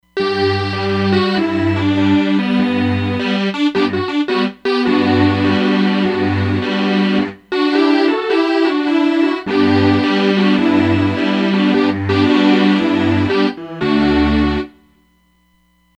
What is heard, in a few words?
Magnolia Str; Roland Fantom XR